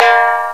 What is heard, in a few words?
TYMPANI, DRUMS; FAIRLIGHT CMI IIX